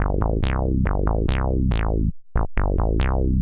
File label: TECHNO BASS 140 A